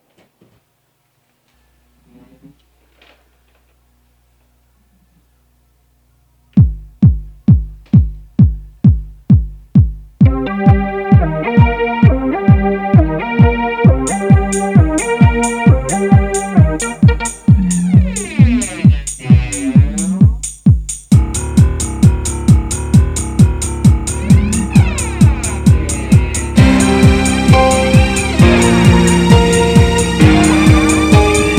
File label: Dark Electric